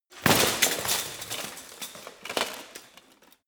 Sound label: bodydrop long